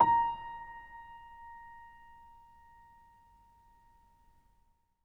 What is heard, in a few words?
Acoustic Piano, piano stereo; InVision Interactive; Miroslav Vitous